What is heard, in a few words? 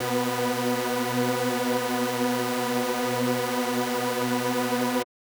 SuperSaw B4; KG MegaSaur; M8